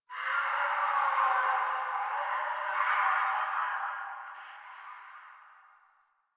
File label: Distant Infested Screams Winter; Ambience; Warframe; NineteenNinetyNine